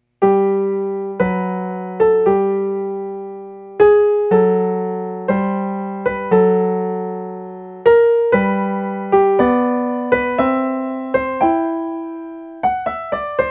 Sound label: Adagio from Piano Sonata; Beethoven